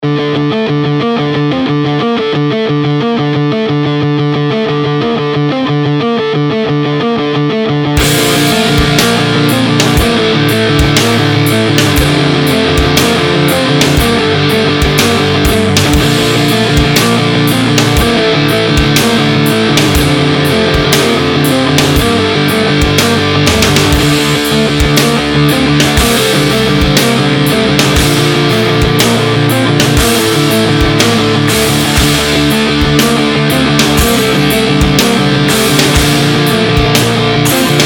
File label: Guitar Rigs